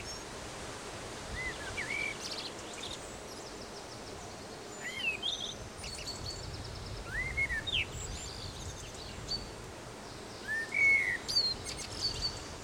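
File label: Scotland inn road morning; the 39 steps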